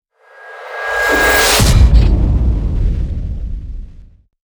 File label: hit swell; Ninja Tracks; chemical